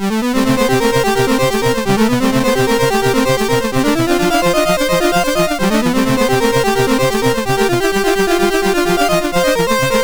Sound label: Bulldog, MOS6581R4